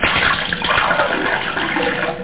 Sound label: drink; DRAGON20